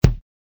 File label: kick